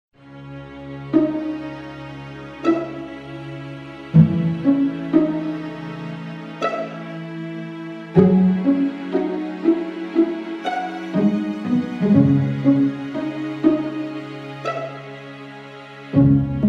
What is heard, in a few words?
ringtone